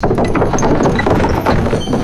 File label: heavy wooden drawbridge dropping and slamming; doors